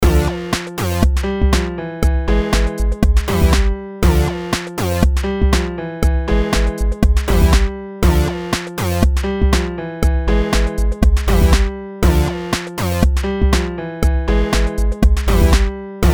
chirp

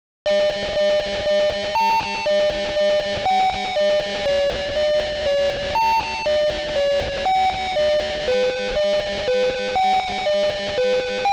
Sinewave Project

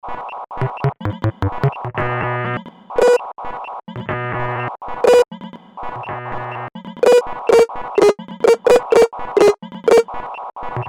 Sinewave Project

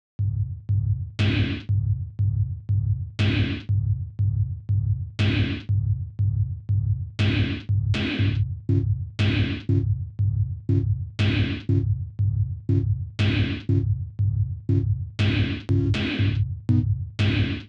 Sinewave

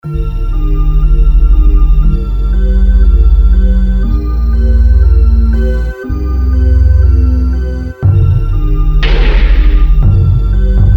Sine Wave

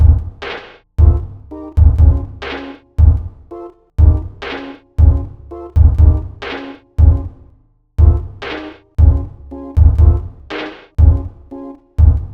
Sinewave

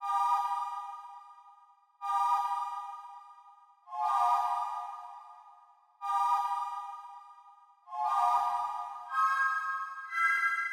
Sine Wave Project

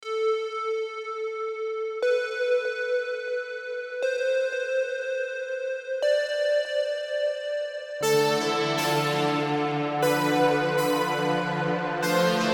Midi

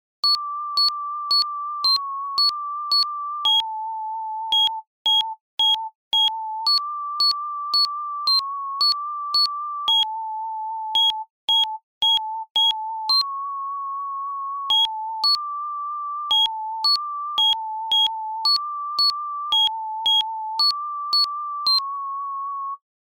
Signwave

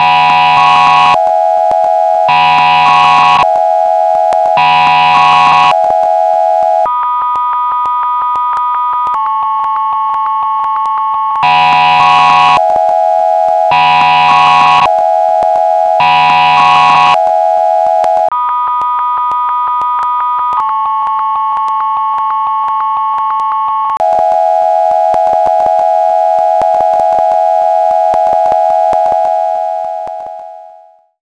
sine wave

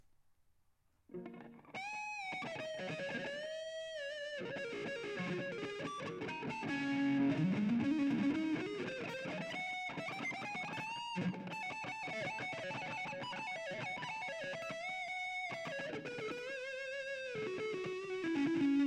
Sinewave

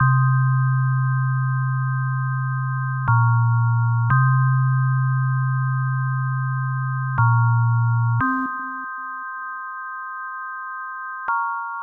sine wave

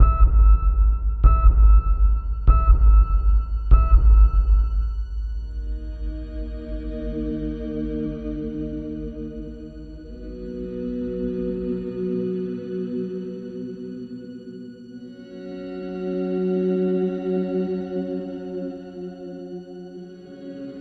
Sinewave

